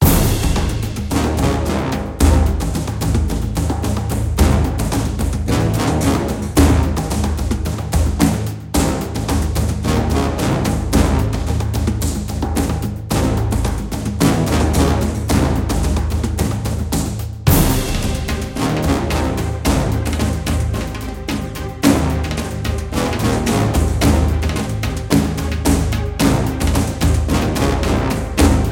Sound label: Battle Music; Rezrog